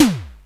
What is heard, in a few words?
TOM, Simmons SD-8 Drums